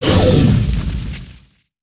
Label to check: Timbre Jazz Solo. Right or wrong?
Wrong